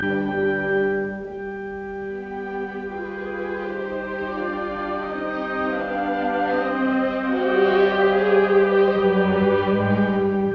Piano Concerto in E flat major Andantino; Mozart; Jeunehomme

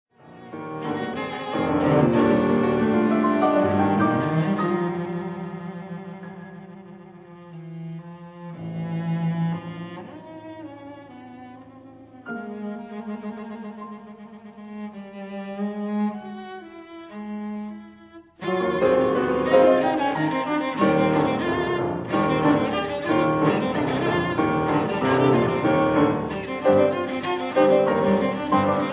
Sonata for cello & piano, Overture

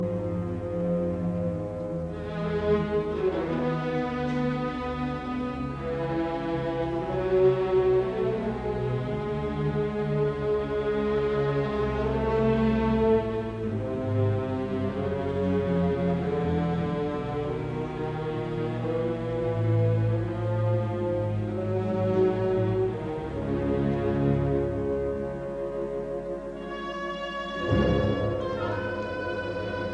Piano Concerto Pezzo serioso; Op 39; Prima Pars; A Rera Pars; Ultima Pars

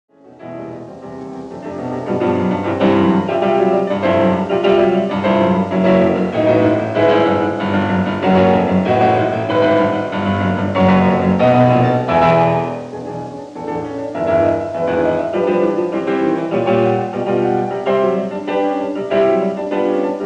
Symphonic Etudes for piano, Etude, Allegro molto; Schumann; Op. 13

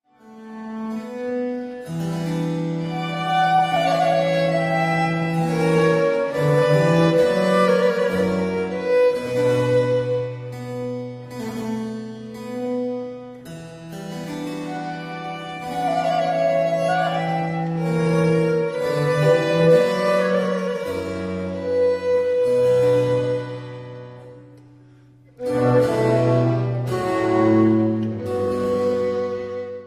for 2 violins & continuo Sonade; L'Espagnole (La visionnaire); Les Nations

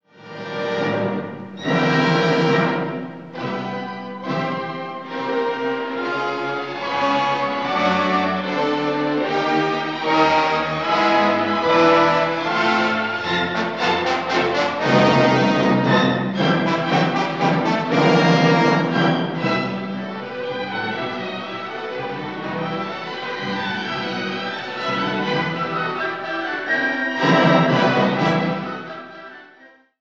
Symphony No. 4 IV. Allegro energico e passionato; Brahms

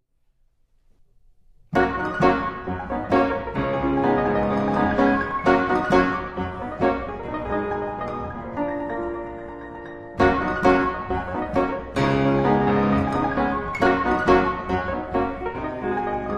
Etudes for piano in Gb major

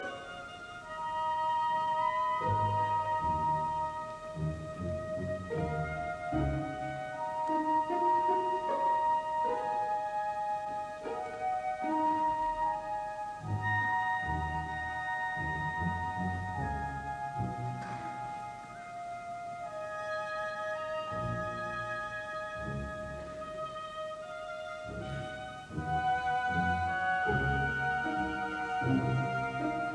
Symphony in E flat major; Symphony of a Thousand; Part II; Final Scene from Faust

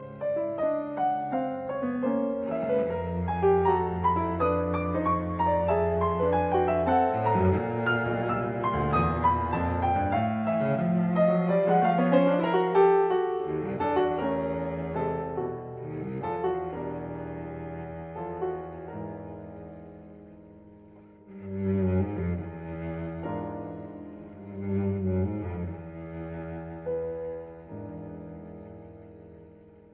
Cello Sonata Allegro agitato; Schifrin

